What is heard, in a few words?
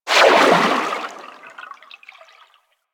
movement transition; Warframe; Vehicles; Sharkwing